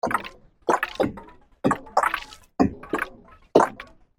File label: Strange Old Magical Machine; Miscellaneous Cartoon Sound Effects; fantasy sound effect; Andrew Fleming